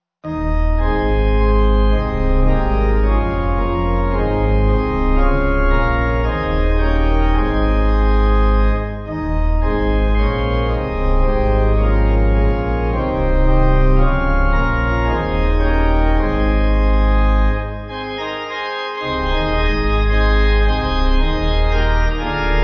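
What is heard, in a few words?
Ab Pipe Organ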